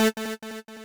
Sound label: lead2 a3